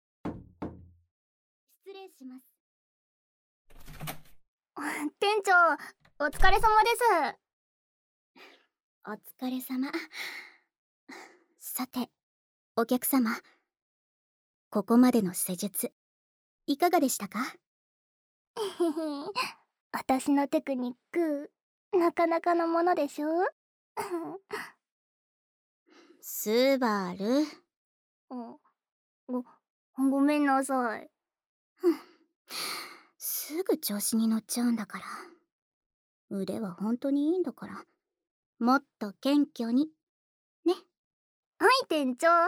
ヘッドスパ＆オイルマッサージ; ASMR

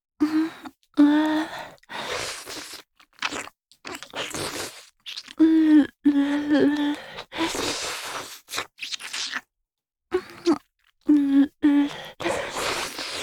陰部に飛び散った精液を舐める・吸う; チュパ音抜粋集

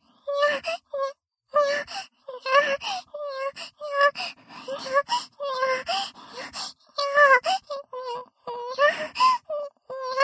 ロリ喘ぎループ

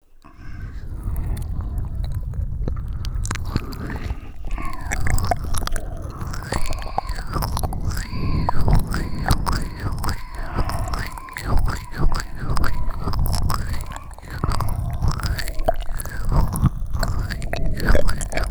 ASMR, まったりMIX両耳; 脳バグ

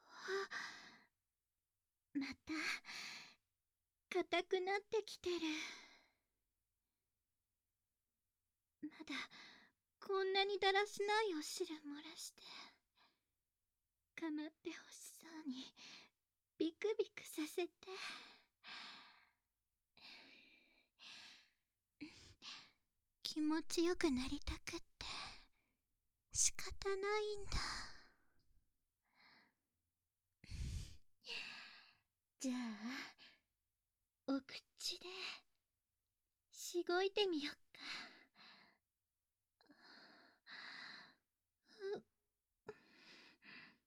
（環境音なし） フェラ; 先輩のえっちなサービス2（フェラ）

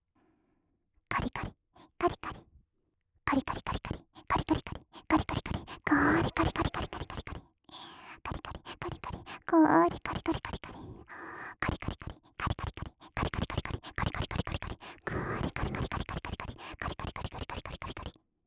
カリカリ速い; ASMR; オノマトペ; ロリ

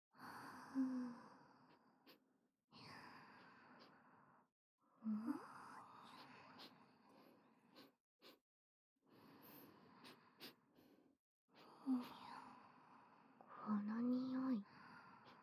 寝かしけ耳舐め; ムギの甘やかし寝かしけ耳舐め; ASMR

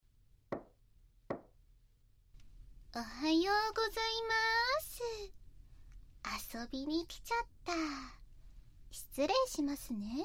喘ぎ声あり; 突然自宅にやってきて

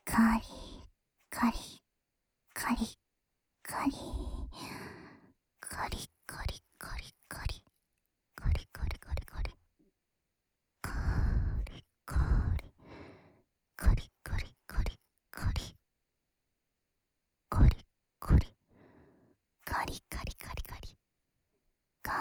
乳首責め（かりかり・左）（SEあり）乳头刺激

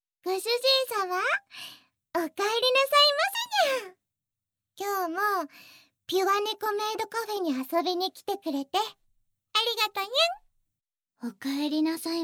耳舐めフェラチオ, ASMR; 猫耳メイドのチ○ポに魔法をかけながらの耳舐めフェラチオ♪

